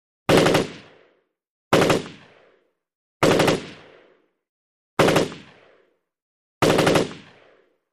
M-16 Automatic: Multiple Bursts; Loud, Low End Automatic, Multiple Shot Bursts. Five Bursts With Short Echo. Close Perspective. Gunshots.